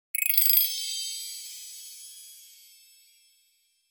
magical glimmering

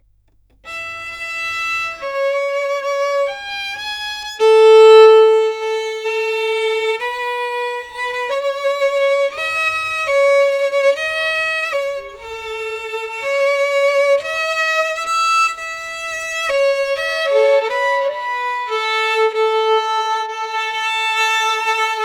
classic mysterious violin; classical

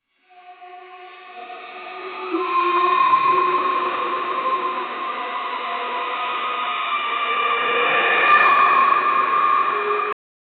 a sound of secret revelat, mystery

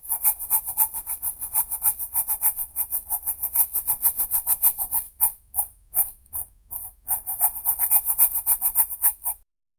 sprinkle salt with hand

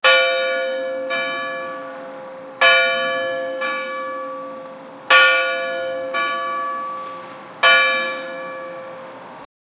scary church bells

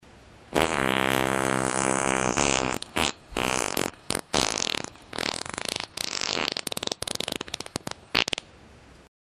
short bassy farts; humor

